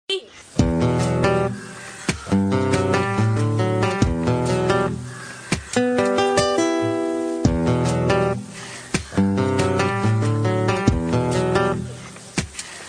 guitar bass ringtone

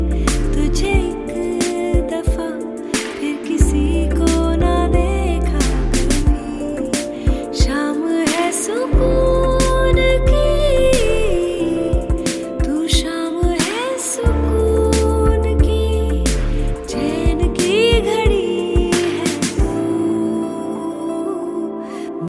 hindi ringtone

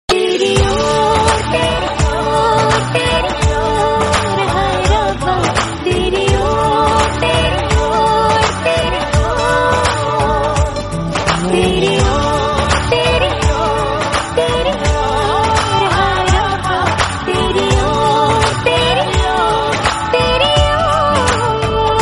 Bollywood romantic song tone ringtone

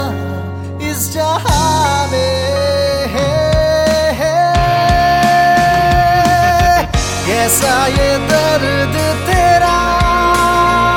hindi music ringtone

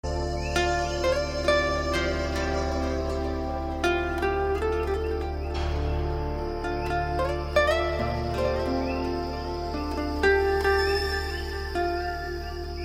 guitar way ringtone

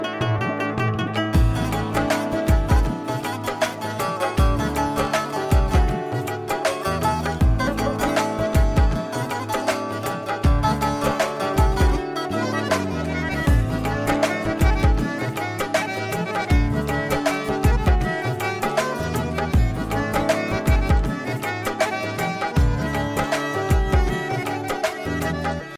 sweet music ringtone, bollywood ringtones

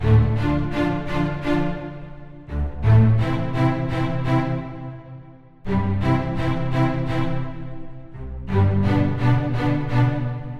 strings symphony cello musical tone ringtone

